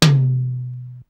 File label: MD TOM; Hollywood Edge; The Big Bad Beatmachine; XR-10